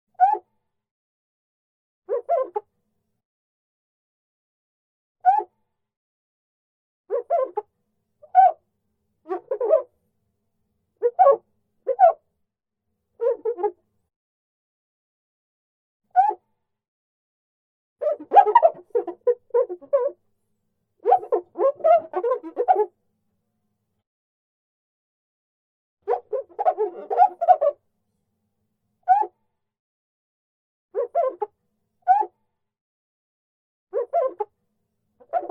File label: bird reflection; bird reflection for wet fingers and mirror